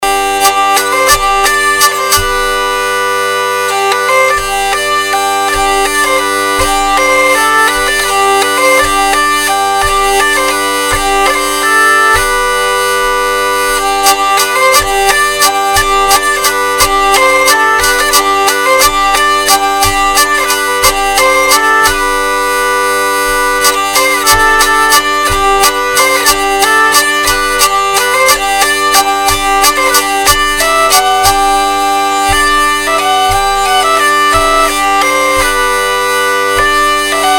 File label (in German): deutsche G, vielle